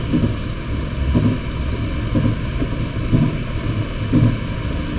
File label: Run 2, train; nankai6000; OpenBVE